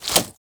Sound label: Nunchuck Equip; Warframe; Infested; weapons